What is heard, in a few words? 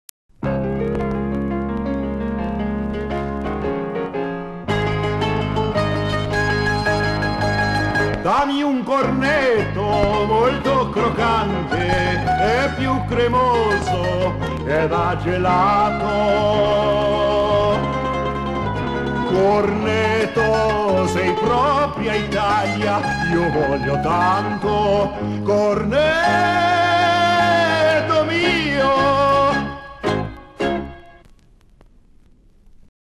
jingle; corneto